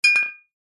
foley bottles x2 beer full single knock together clink; zapsplat